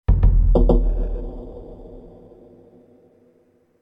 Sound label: Creepy Percussion